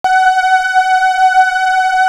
MIDI pad, synth pad

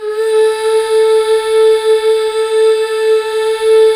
MMHH, BACK. MMMHH Fem

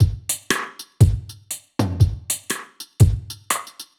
Drum Kit Dry 120, dub drums samples, 120bpm; musicradar

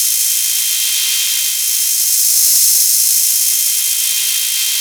FX, Drum Machines; Fricke Schlagzwerg

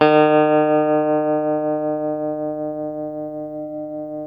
TELE TREM E, Guitars, TREMO; Spectrasonics; Hans Zimmer Guitars VOL-1; TREMO-LUXURY

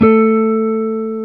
Jazz Guitar

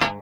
A#3 FUNK CHORDS, Guitars; Spectrasonics; Hans Zimmer Guitars VOL-1

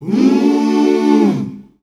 Mmm, sung phrases; Voices Of Africa

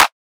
drum clap super dry; Virtual Riot; Drum Hits

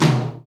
TOM, KIT Real-Kit

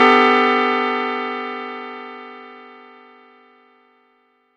Chord; ModelCycles

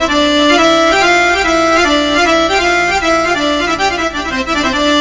SYNTH, ARABIC

